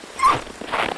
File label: Dog Sled